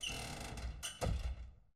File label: close hatch; angband